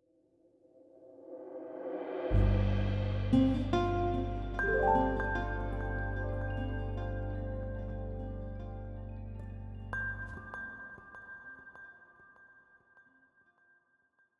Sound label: dessertmtn intro head 148bpm 30beats GMin